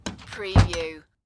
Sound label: Shut Front Door